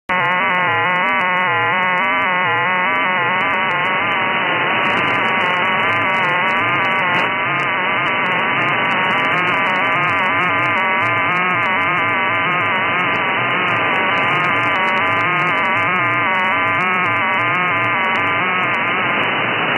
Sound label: strange QRM